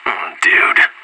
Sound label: scared emote; anarchist